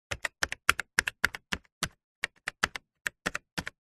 foley cash register electronic buttons press sequence; zapsplat